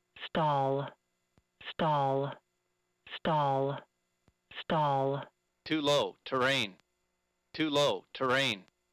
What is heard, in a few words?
Airplane Warning